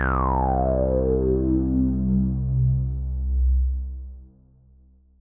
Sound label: Bass; mpc expansion